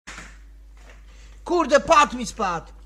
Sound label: pad